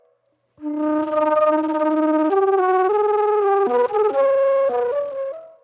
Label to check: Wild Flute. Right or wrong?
Right